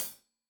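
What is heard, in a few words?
hat closed, hi-hat cymbals stereo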